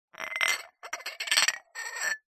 household glasses drinking x2 clink together; zapsplat

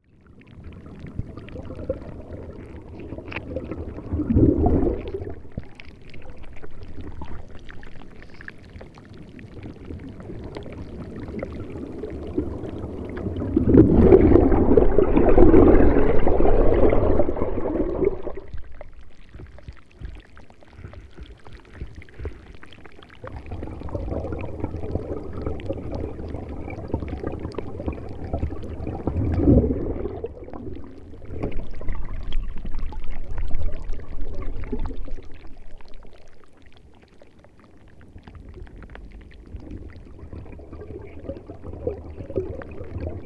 nature ocean waves small rocks underwater; felix blume

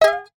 cartoon ping twang string pluck; zapsplat

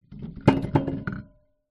apple down in china fruit bowl on top of others

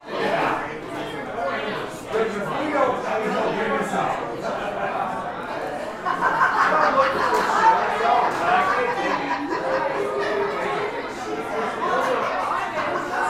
public places bar american voices busy walla; martinimeniscus

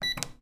dryer button press beep; household; zapsplat

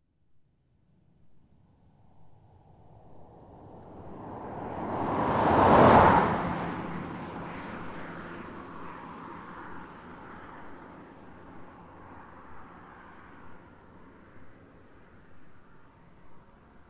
transport car approach pass fast country road

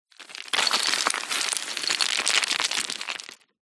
foley stones rocks small landslide fall down; zapsplat

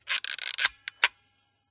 smena symbol camera wind film lever; mkh8060; PMSFX

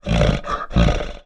animals bear growl grunt

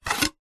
household plastic plate pick up lift off another

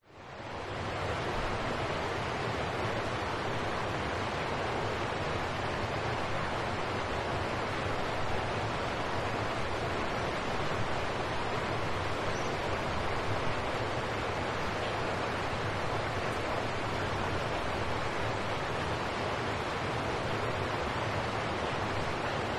nature creek fast flowing 60m below australia; zapsplat

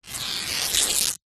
household band aid plaster strip rip tear

